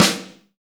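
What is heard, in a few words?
snare drum; Best Service Gigapack 2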